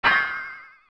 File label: Sword Clang; Zelda; OOT